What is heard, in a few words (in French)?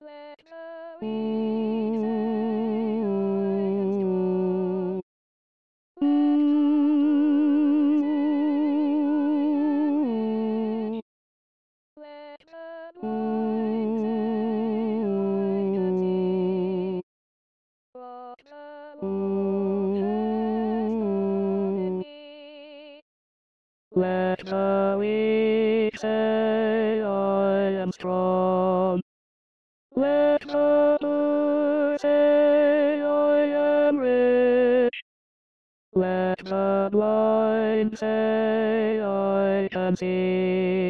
Hosanna Tenor, choristes